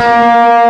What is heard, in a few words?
A#2 OVER D, GUITAR; AKAI S-Series CD-ROM Sound Library VOL-8